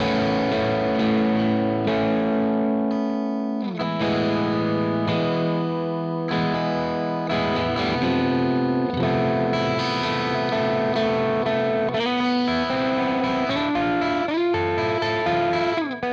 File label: Hagstrom Ultra Max Special Bridge Mid Split Crunch